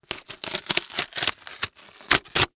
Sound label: Industry Cardboard Box Tear Slow